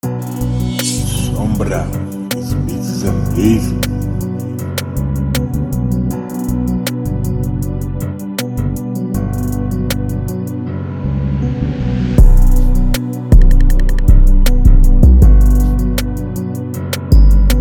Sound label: Thunder 158 bpm Bm